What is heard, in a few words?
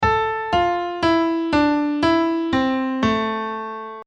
Minor Scale Piano